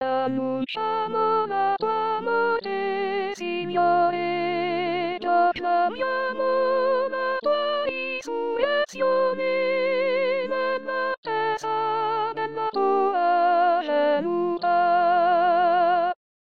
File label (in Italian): Soprani